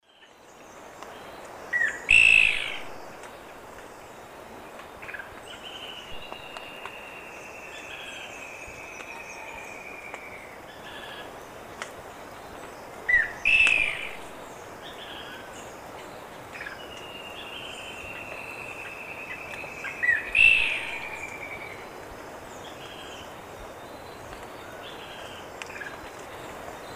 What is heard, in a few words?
Cercomacra nigrescens, song, Eastern Lowlands, S. America